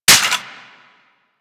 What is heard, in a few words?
Sicarus Mech 2D, weapons; DE; Warframe